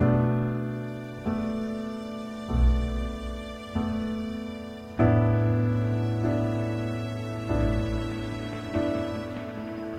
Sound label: death intro